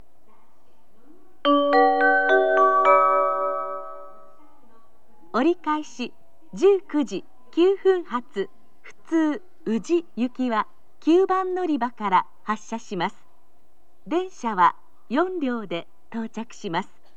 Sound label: turn local, kyoto, station; uji